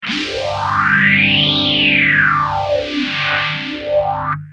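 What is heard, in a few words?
guitar boss ph3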